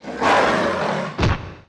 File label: Ratman Die; Seer; GamigoNA